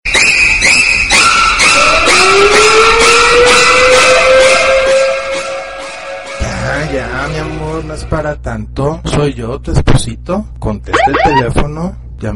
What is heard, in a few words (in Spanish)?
alerta de esposo